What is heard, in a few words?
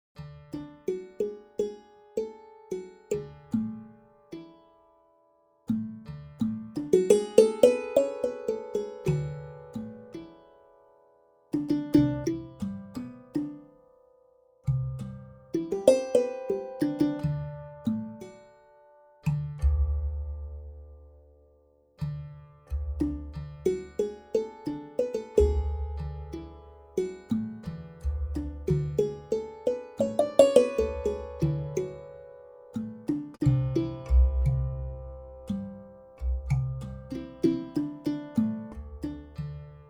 Luten Wood